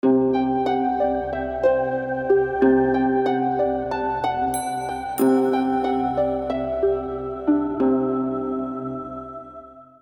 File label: mystical